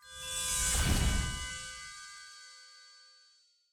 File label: WALKING WILD EXPANDED; wilderland